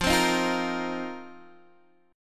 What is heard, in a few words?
F 7th Suspended 4th, Guitar DADGAD